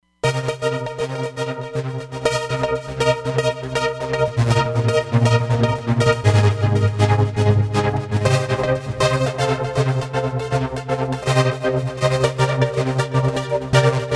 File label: Chop Synth, Roland Fantom XR